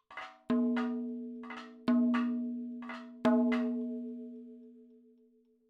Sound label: 110 7 8 MADALAM FILLS, percussion; Sample Banks; MADHALAM; MADALAM 7 8 FILES